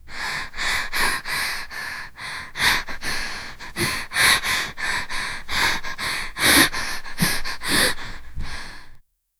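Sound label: heavy breathing and panting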